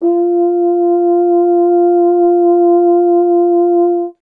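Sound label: French Horn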